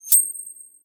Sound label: Coin up